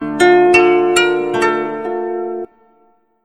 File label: KOTO LOOP